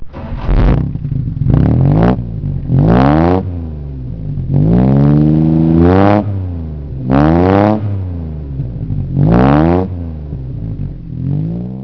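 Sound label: Jaguar XK, classic car; jagxk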